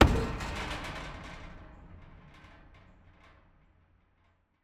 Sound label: bridge, stomp, large, arched, wood, metal, heavy iron, deep stream, greenway, strong, resonant; loose; Eiravaein Sound; Gravitas